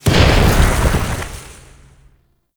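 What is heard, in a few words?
Sonic Dissipation Projectile Explosion; Warframe; Focus; Vazarin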